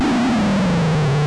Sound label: magic; Curse of the Azure Bonds